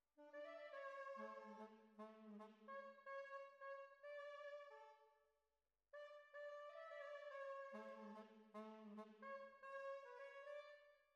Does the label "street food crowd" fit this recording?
No